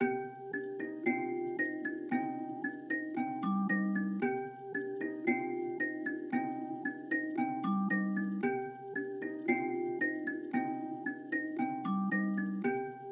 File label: 114 BPM C Min Bell; Dark; Cymatics; Fracture